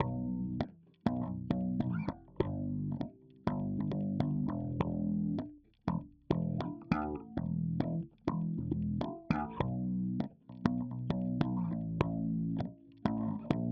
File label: bass